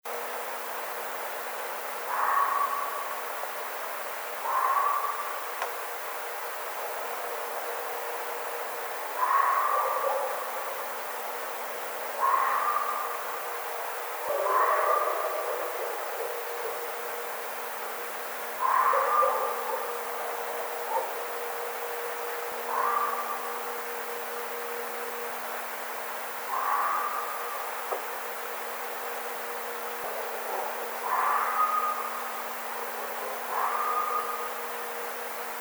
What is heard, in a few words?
Red Fox